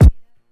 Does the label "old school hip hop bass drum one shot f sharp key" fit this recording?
Yes